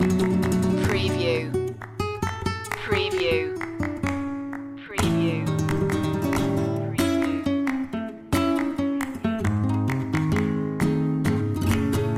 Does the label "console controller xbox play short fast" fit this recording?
No